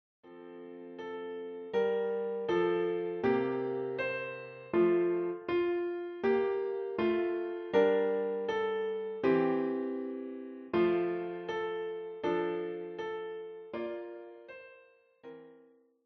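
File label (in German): Der Mond ist aufgegangen Klavier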